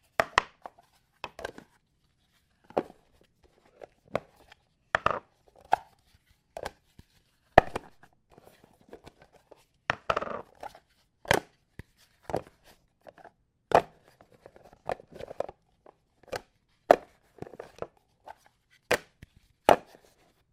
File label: Tupperware Stacking